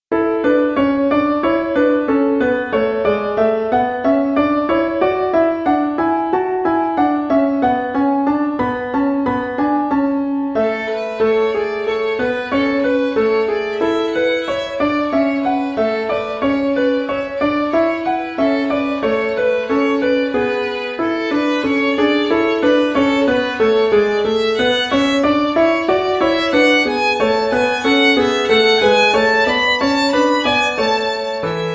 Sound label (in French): Meli melodie, Violon et Piano